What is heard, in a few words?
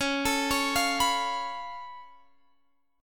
Db Augmented 7th Db down Guitar Open G